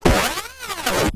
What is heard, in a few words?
Miscellaneous Glitch